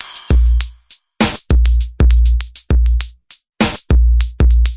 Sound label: abstracted, drum loops and breaks, 100 bpm; 091 to 100 bpm